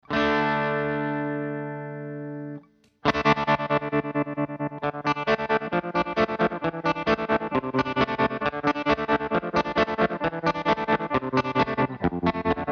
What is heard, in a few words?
empress tremolo, Rhythm 2, two speed mode, depth full, tube wave setting (with overdrive)